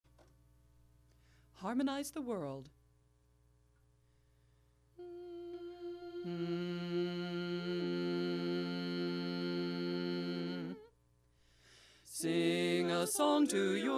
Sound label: Harmonize bass dom